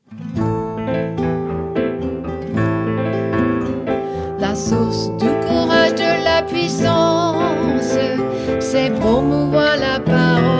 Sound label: Song, FR